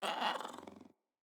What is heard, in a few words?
Kitten Speak Playful; Catbrow